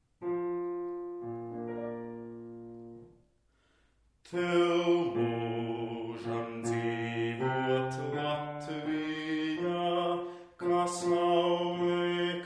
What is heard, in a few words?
bass